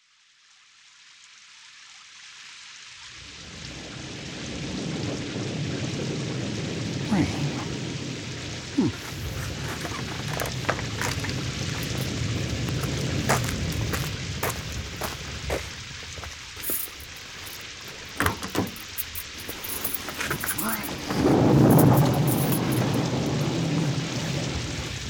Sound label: string quartet